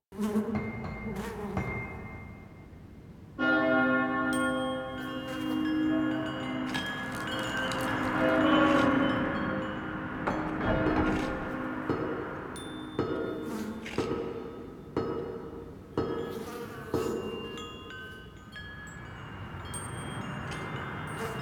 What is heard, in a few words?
Exterior Foreground Alley Loop; Ambience; Duviri; Warframe